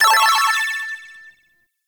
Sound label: Snake Game Success; Warframe; Nineteeen Ninety Nine